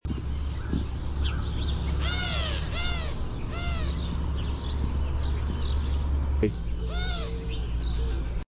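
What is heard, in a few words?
Eurasian Collared-Dove coo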